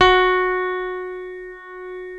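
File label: CP70 B5, Yamaha; East West; Global Sounds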